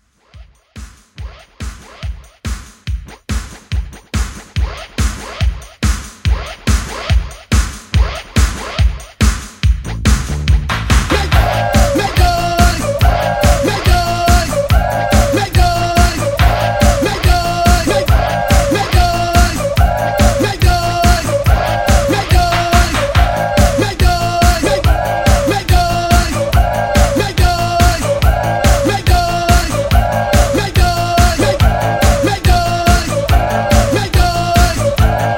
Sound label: Pop Redrum Hype; Remixfreakz